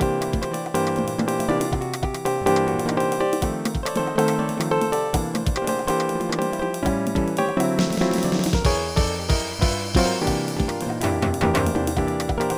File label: solo; Dr. T's MIDI Recording Studio